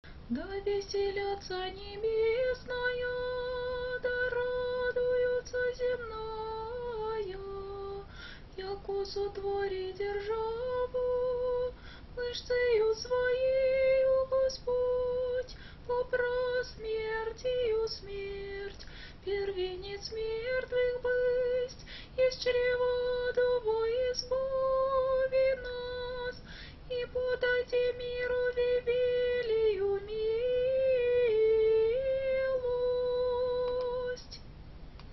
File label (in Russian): Tropar 1 glas 1 golos